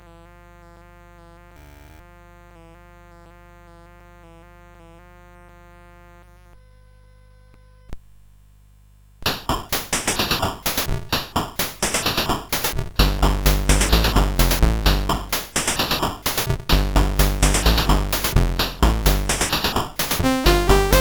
Improvisation, CSG8580; on Ibexes

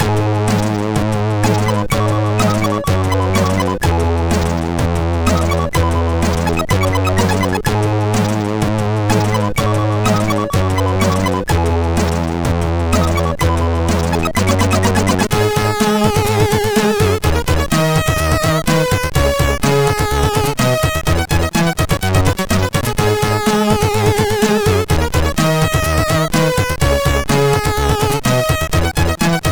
Megahertz tune, MOS6581R3; Megahertz 0 tune 3

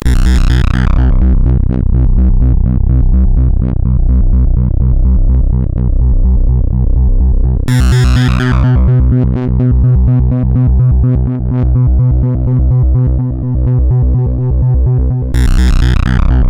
Bass Mania, sid, MOS6581R2

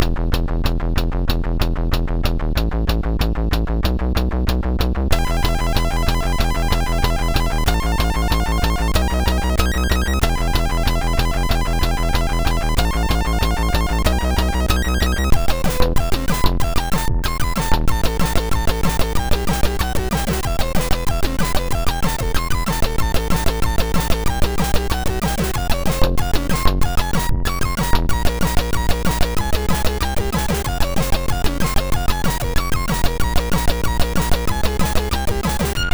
Loops sid MOS6581R2

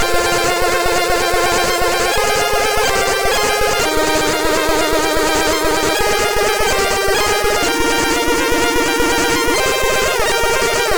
High Trance, MOS6581R3

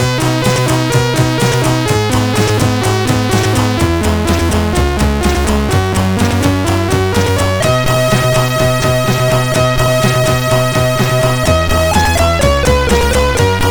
Air on G String, CSG8580R5

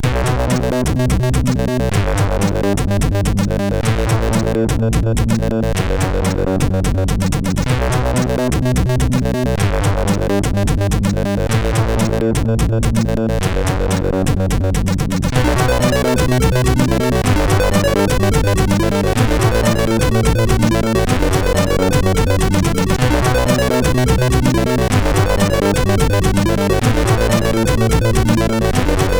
Disco Music, sid MOS6581

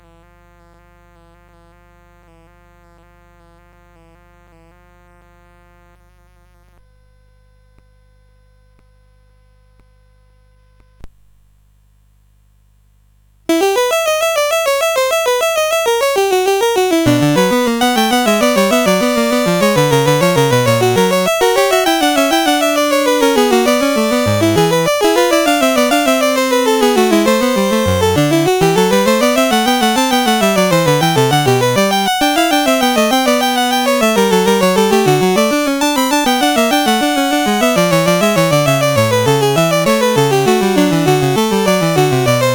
Fugue in E Minor, CSG8580R5

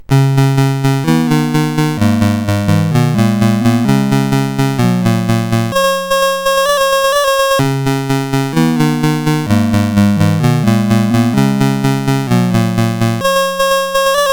sid CSG8580R5, GAMES; Dragons of Flame

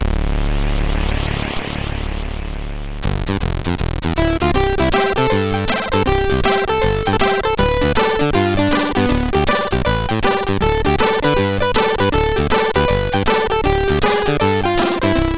Xmas sid CSG8580R5

